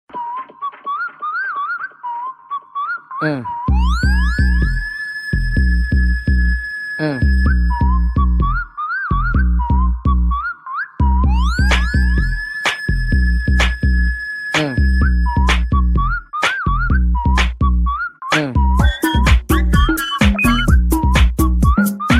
the whistle ringtone

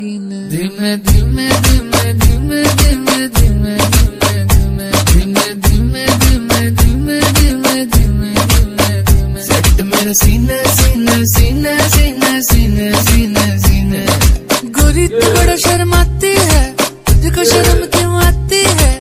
sad music ringtone, bollywood

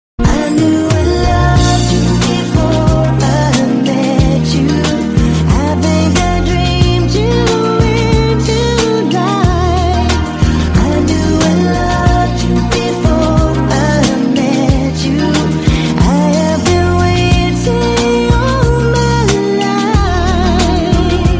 english song ringtone

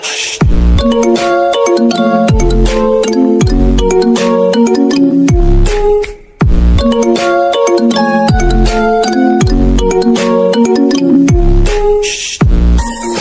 cool english ringtone

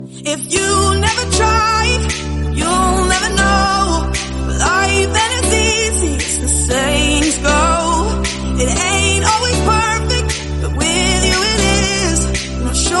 motivational english song tone ringtone

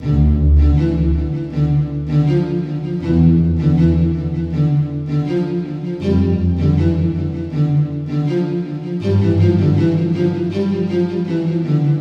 violin music low pace call tone ringtone

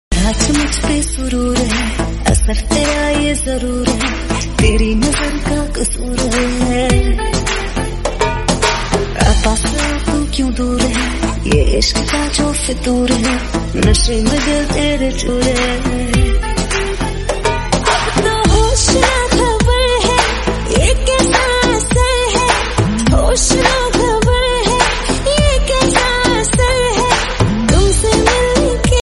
bollywood remix song tone ringtone

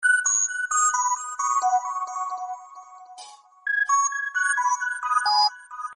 musical message tone; sms ringtones

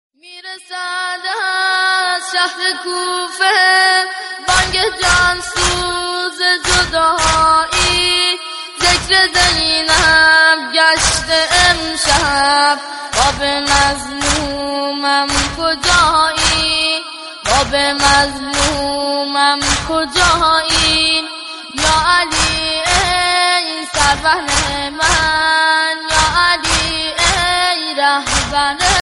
noha arbi tone

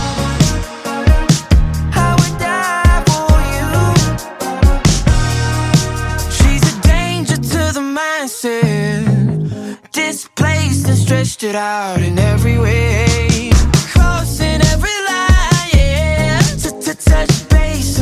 new english ringtone

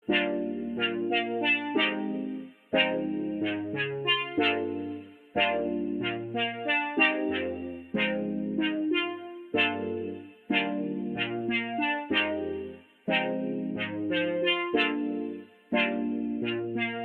ringtone; samsung skyscrapper

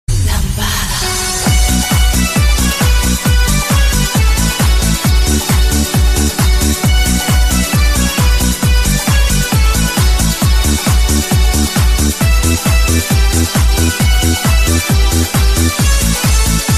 remix ringtone

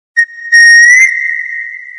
lovely short whistle message tone

